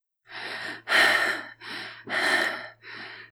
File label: Female Breathing; Phasmophobia